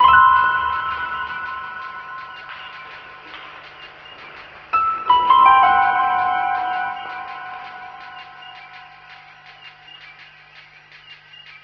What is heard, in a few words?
keys, Sad